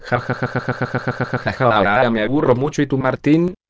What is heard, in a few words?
jajajaaaaa